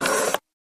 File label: Door Outer Close Dam, dat machine